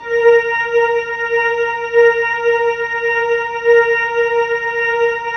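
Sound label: synth Morpheus; Sound & Vision - Gigapack II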